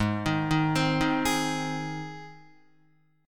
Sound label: Ab Major Ab down Guitar; arpeggiated; JGuitar